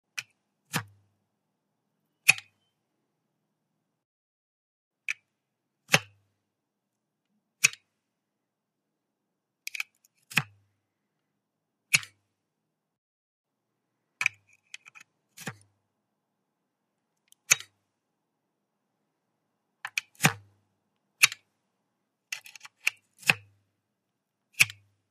Insert a flash drive, remove it from the usb connector; computer